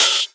Open Hihats, Cymbals

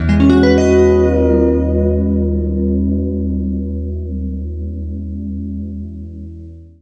FLESH CHORDS, Guitar; East West; Steve Stevens Guitar Samples Collection

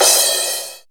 FX Cymbals, Percussion; Dance Synths

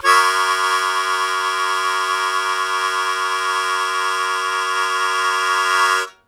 Blues Chord Attack F#1, Harmonica; Zero G - Harmonica

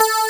DG WAVE, DIGI SYN

